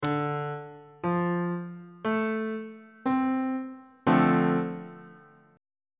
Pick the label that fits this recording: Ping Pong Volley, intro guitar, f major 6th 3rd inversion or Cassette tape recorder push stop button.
f major 6th 3rd inversion